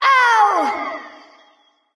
ouch light; smite; voicepack; hel

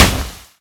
fire hit; smite; god merlin

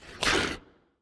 grunt; fenrir

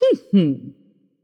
nv heh heh; smite; voicepack; morgan le fay

